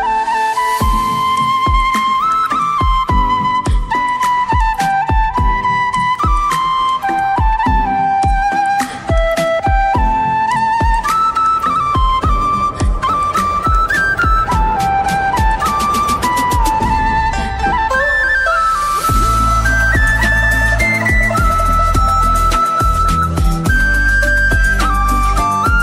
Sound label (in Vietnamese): sáo trúc, Giai điệu sáo trúc cổ phong, trầm mặc và đầy ám ảnh; nhạc chuông